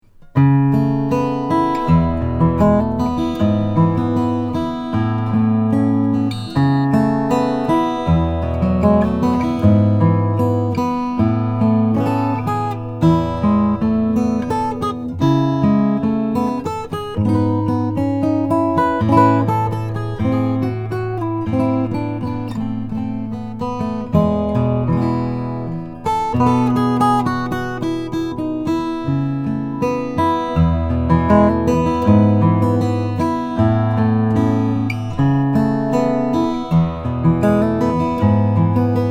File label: noemi the wedge figured sycamore